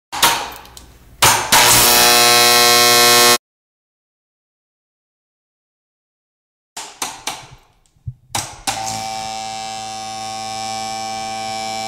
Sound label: Hard Electricity